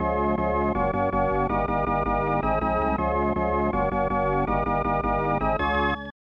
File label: Fanfare ~ Excitement; super bases loaded 3; snes